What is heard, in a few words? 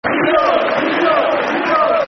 Yiddo, Football Chants (Soccer Song); Tottenham Hotspur Thfc